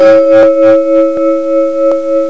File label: bells